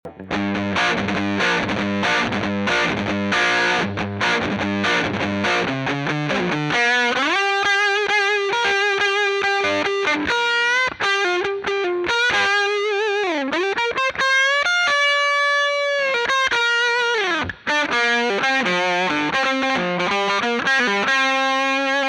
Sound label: 57 Dirty Guit